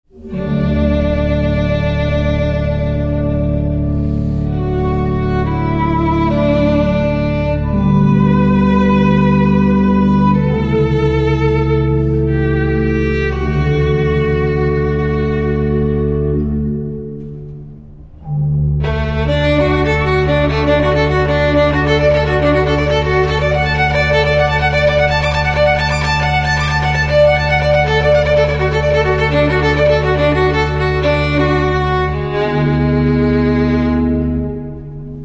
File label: violin organ